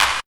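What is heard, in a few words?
CLAP; E-Lab; X Static Goldmine 1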